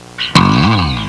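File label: neat bass; Sound Library 2000; Wayzata Technology; Music In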